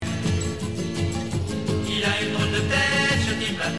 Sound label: Modulation